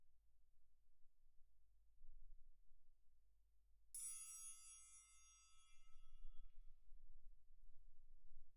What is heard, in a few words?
Perc